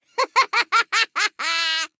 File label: Laugh; Gnar